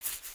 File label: Scratch